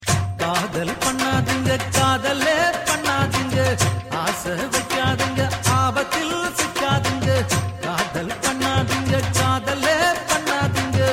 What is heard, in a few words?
Tamil Love Sad